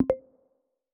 Unlock, phone ui

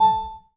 Class Harp

